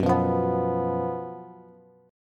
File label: Gb Major 7th Suspended 4th Gb Bass; GbM7sus4; strummed; JGuitar